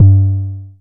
analog tonal, Kickbank

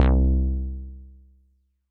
squiggle bass; Ableton; Factory Packs; Chop and Swing

